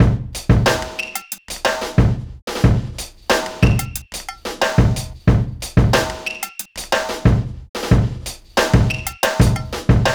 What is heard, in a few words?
Urban Beat, Drums